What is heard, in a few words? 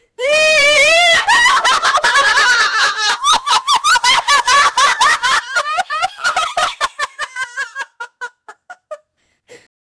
scary and crazy laughter, villain